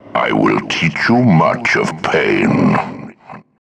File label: pain